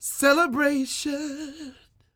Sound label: croon, Vocal Planet Blues Soul; Spectrasonics